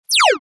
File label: LASER DESCEND; Multimedia; Lasers; Blastwave